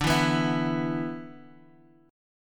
D 6th Suspended 2nd D, chord

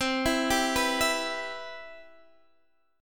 C Major 7th, Guitar Half Step Down

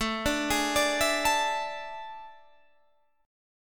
A Major 7th Suspended 4th

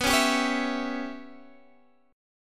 Db Diminished B; strummed; Dbdim/B

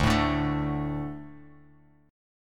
D 5th D, down, Bass Standard; D5 strummed; JGuitar

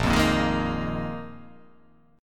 A sharp 7th Suspended 4th A sharp; x,1,3,3,3,3,0,0

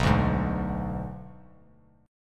B Minor 6th B, Bass Standard; Bm6 strummed; JGuitar

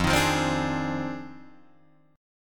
F Minor 13th, Guitar Drop D

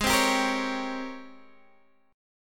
G sharp Major 11th G sharp, down, Guitar, Open D

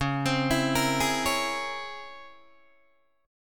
Db Minor Major 13th Db Guitar DADGAD; DbmM13 arpeggiated; JGuitar

